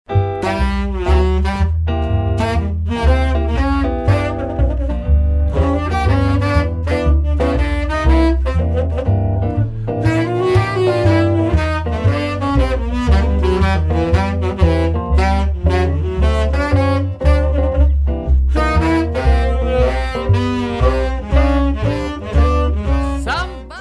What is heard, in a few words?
blues sax, jazz